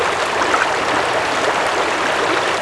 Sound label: cascade